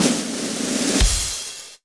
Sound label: Roll a Drum (Results Announcement Jingle)